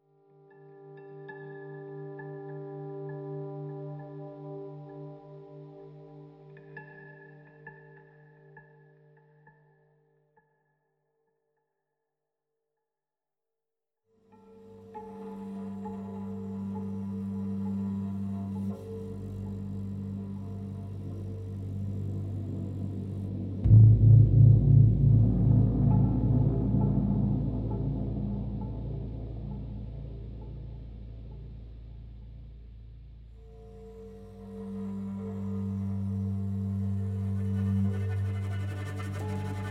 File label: Exploration Outlands Minimal